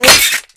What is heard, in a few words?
impact metal; Modern Warfare